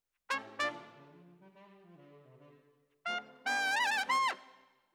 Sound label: Trumpet